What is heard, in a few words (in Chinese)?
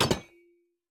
铜门开关声